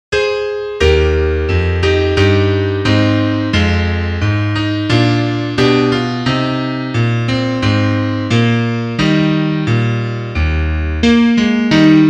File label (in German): Klavier; abschied